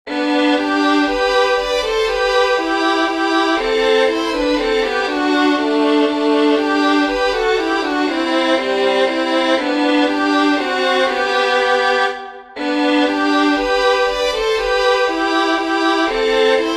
with Harmony, fiddlers; ASHGROVE

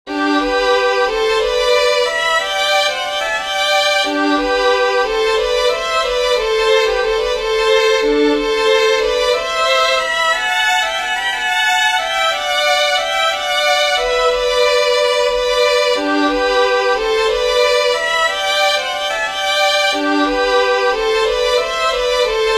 WALTZ WITH HARMONY, fiddlers

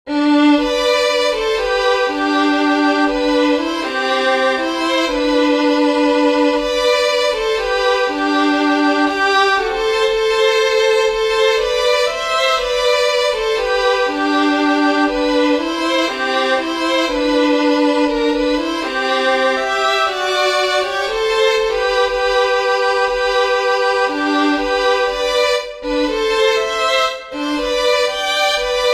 with harmony, fiddlers; BATTLE OF GLENCOE

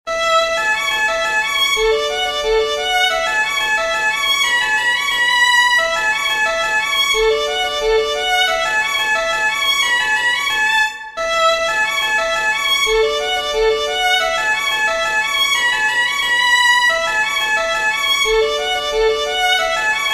HORNPIPE, fiddlers; southern kings fiddlers

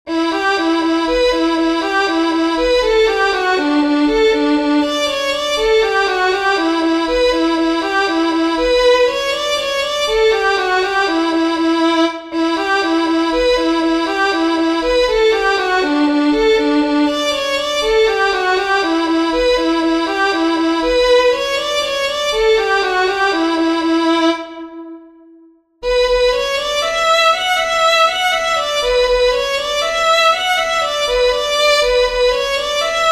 SWALLOWTAIL JIG, fiddlers; southern kings fiddlers

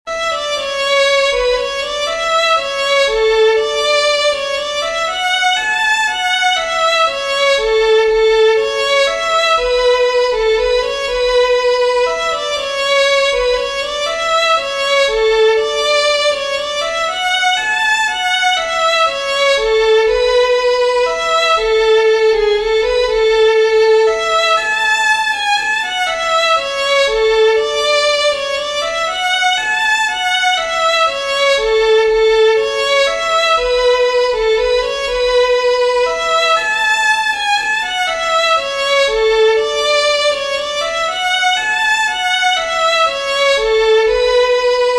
STRONSAY WALTZ, fiddlers; southern kings fiddlers

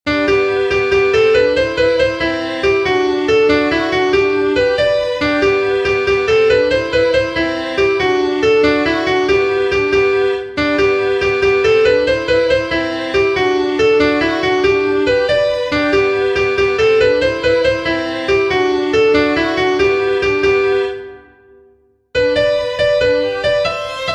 WHEN WATER FREEZES IN THE PIPES, fiddlers; southern kings fiddlers

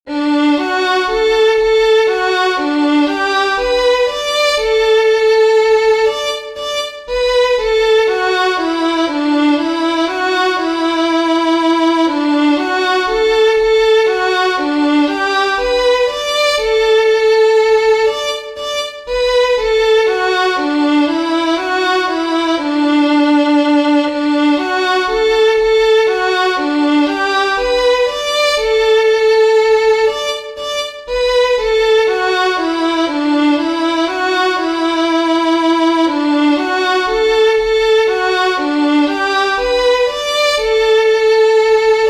WALTZ, fiddlers; SOURIS

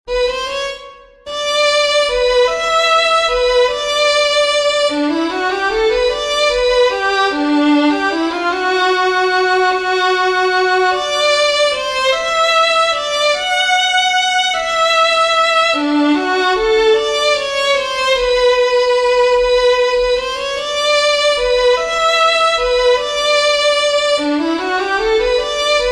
WALTZ, fiddlers; RIVERSIDE; southern kings fiddlers

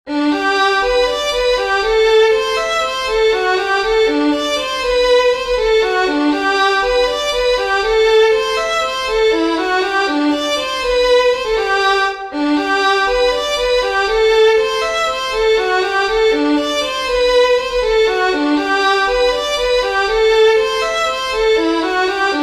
WALTZ, fiddlers; ANNE MCPHEE

